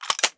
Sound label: g3sg1 clipin, weapons; cstrike; csgo